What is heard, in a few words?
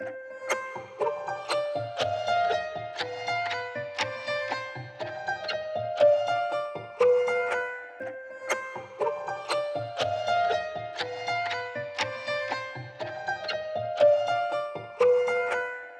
120 bpm C Harmonic Minor, sinister music loops; Boom Times Up